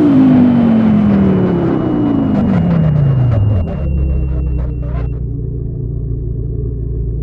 slowdown, vehicles cars; lwcars

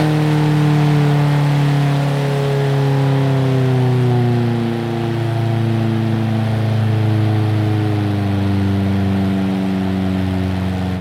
slowdown, vehicles cars; shelby glhs; lwcars

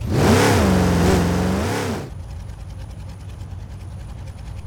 rev, vehicles cars; lwcars

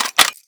mag in, weapons; cw m249

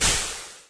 cooldown; zrush

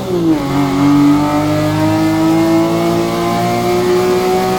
third, vehicles cars; lwcars; tvr sagaris

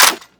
cover close, m249; weapons; cw m249

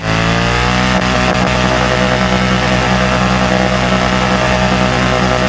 rev, vehicles cars; lwcars; mer c63 amg

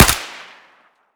fire suppressed, weapons; cw m249

